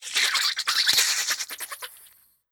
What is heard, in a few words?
Kraken tent